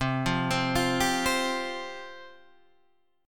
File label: C Suspended 4th C Guitar Drop D; down; chord shape